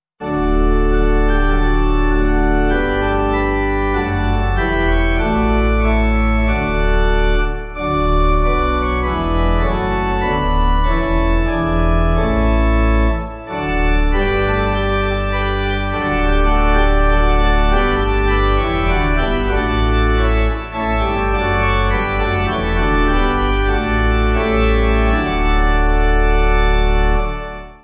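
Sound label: Chimes Pipe organ, church music backing; 8/D; 8.6.8.6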